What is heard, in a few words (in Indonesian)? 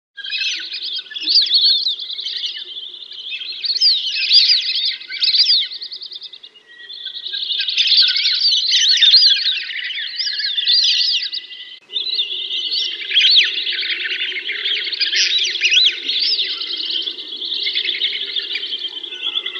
audio burung belibis rumbai ombyokan